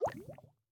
滴水石锥熔岩滴入炼药锅声

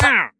流浪商人受伤声

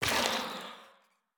幽匿尖啸体破坏声

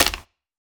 红树根踩踏声

